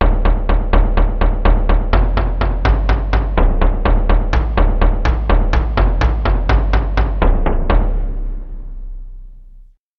melodic tom bass

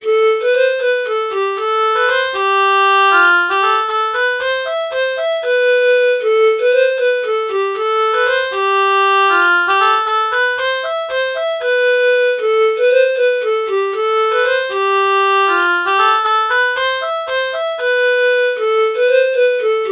pan flute oboe false melody morph

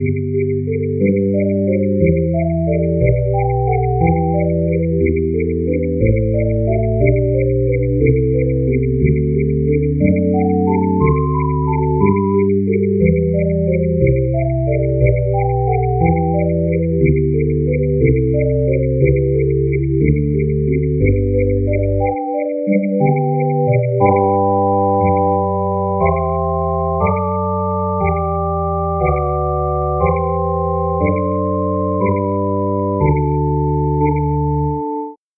whistle

